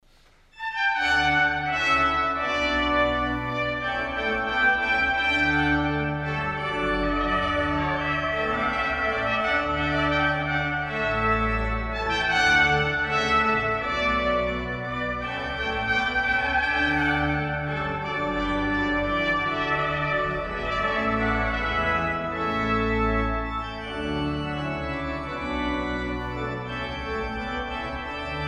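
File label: suita d-dur bouree, varhany; g.f.handel; inau koncert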